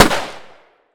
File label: gun tikka t3 battue 308 Swpph Mono Shot Strong